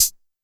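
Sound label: TR808 pedal hi-hat, Roland SH-32 Drumset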